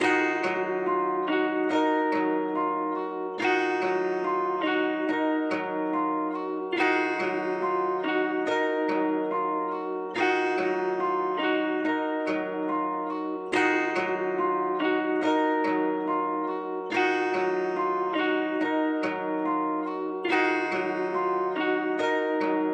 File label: 142 BPM D# Min Guitar; Melody; Loop Stems; Cymatics; Cymatics - 2022 Melody USB Expansion; Jacked